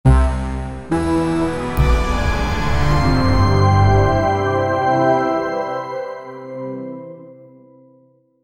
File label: dramatic introduction, chess